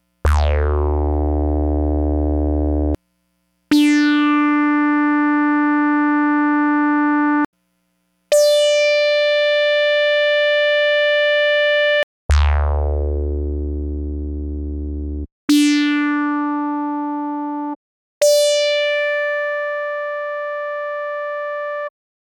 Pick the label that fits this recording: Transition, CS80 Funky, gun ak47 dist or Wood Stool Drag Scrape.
CS80 Funky